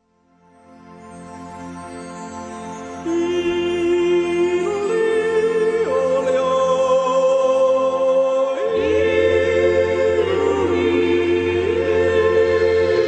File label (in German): Jodler